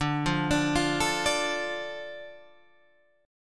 D Minor D Guitar Open G

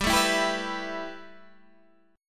B 7th Suspended 4th Sharp 5th G

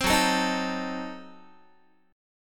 Gb Diminished 7th, Guitar; strummed; JGuitar

